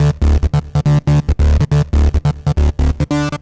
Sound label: Trance Bassloop 140bpm, Bass Arps